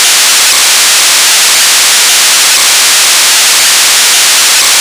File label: noise 1E